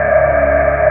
Drone; Mo' Phatt; Digital Sound Factory Mo' Phatt

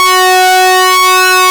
SYNC, ARP Synths; Analog Odyssey; E-MU Formula 4000 Series Vol. 3 – Analog Odyssey

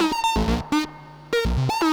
TRAILER BREAK 124 bpm; POTBreaks

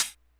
hihat; Big Fish Audio; Hardcore Breakbeat Action; Robot Live

